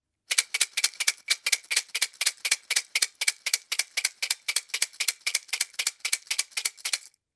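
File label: toothpicks sound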